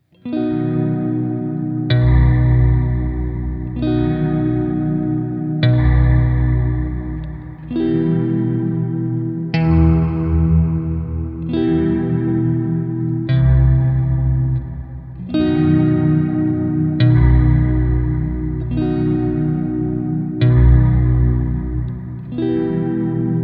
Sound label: shimmer; BigSky